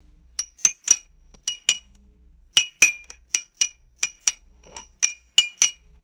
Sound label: bright resonant glass bottle clinks